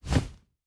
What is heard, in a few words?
Wing Flap, Monsters; Fantasy Sounds Bundle